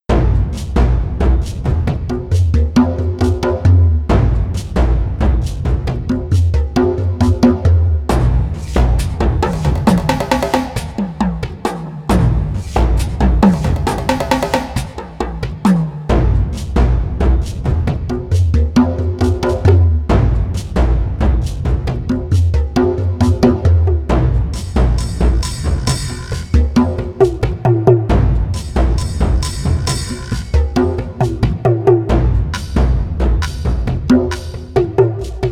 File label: Hawaiian Percussion Organic Funk 120